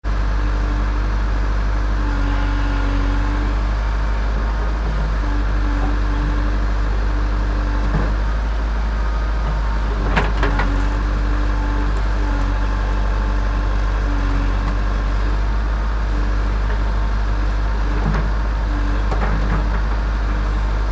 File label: digger unloading rubble